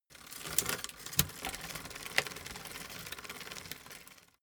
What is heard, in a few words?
bicycle street upshift